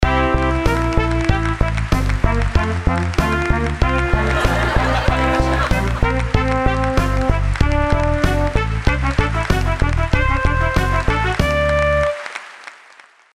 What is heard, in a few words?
pephorn